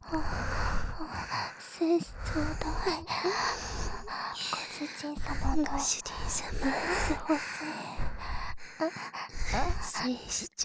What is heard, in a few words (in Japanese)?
喘ぎ; 精子ちょうだい♪